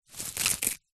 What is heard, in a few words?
grass pick pull rip from ground; zapsplat; foley